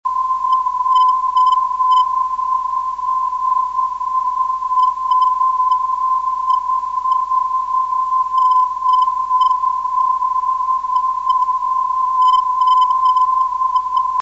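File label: 436 kHz, non-directional beacon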